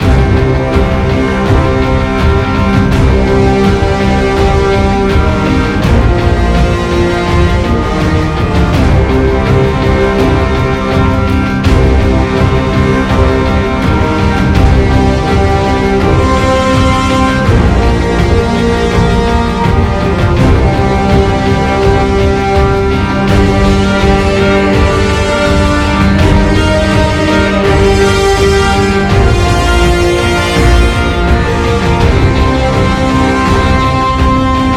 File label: ambienceFreeSpin; asgardianstones